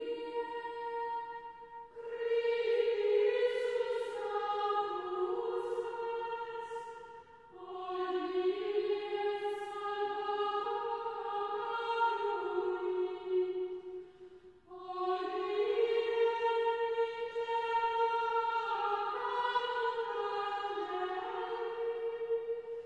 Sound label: Chant Antiphon; Traditional; Chester Processional